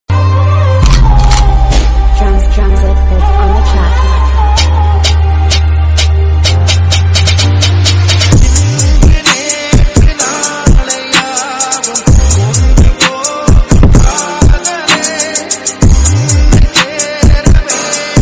dj remix bgm

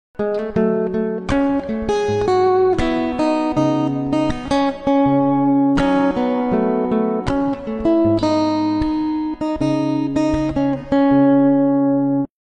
ringtone guitar cover

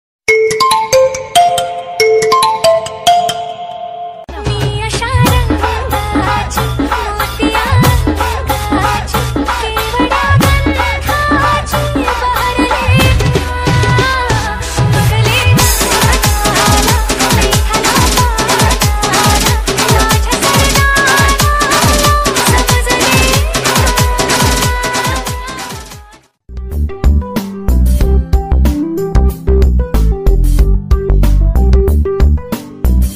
marathi romantic ringtone dj ringtone; tiktok ringtone

